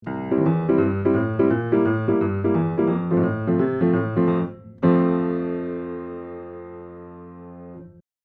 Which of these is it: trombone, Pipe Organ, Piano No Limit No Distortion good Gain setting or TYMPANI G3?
Piano No Limit No Distortion good Gain setting